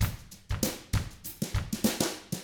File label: FUNKY, Drums; ILIO; Double Platinum Drums 1; 99 FUNKY 1